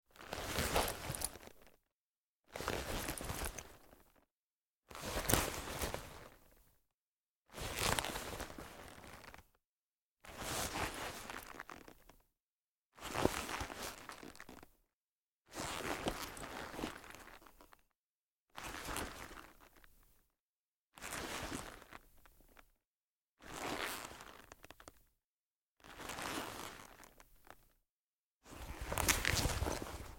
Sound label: Clothes Leather Jacket Movement Rustling Omni Mic; Guild Wars 2 (Original Foley Soundtrack)